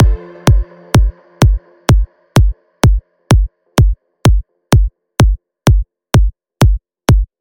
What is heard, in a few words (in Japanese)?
強烈なキック